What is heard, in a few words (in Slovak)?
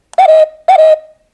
Ukážka melódie zvonenia zvončeka